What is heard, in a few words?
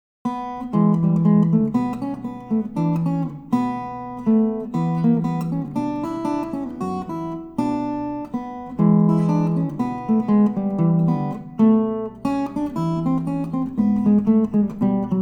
blues